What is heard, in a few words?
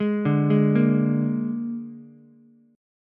Bb 7th Bb down Ukulele; arpeggiated; JGuitar